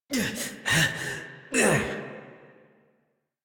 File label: Death Adult; New War; Adult Operator